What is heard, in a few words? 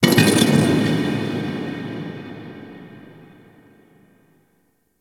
Metal Impact Large; Warframe; Ambience; Cephalon